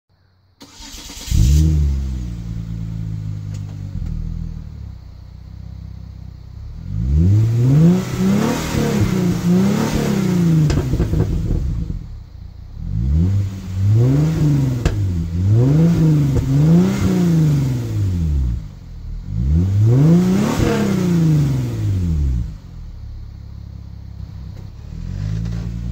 Genesis coupe

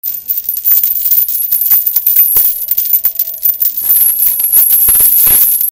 shackles; غل و زنجیر

